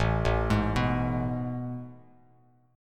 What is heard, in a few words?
A Major 7th Suspended 2nd, Bass Standard; AM7sus2 arpeggiated; 5,0,6,4; JGuitar